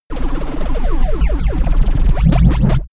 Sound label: space laser blaster cannon damped; krieg-waffen; soundpool